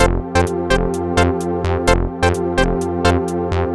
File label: lead